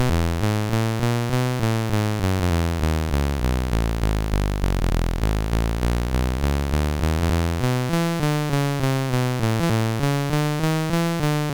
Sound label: sid MOS6581R2, GAMES; Buddy Bubble